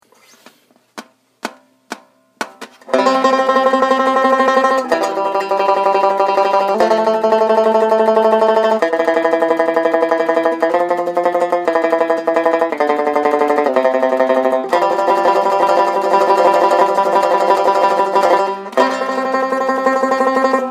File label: tremolo, banjo